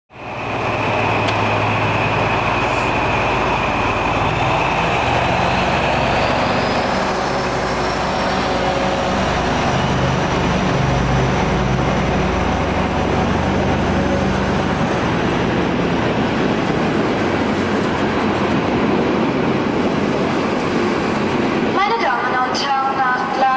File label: DB Br 215 Locomotives; finnish